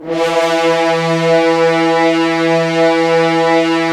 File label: Horns; Roland - LA Composer vol. 4